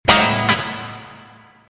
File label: guitar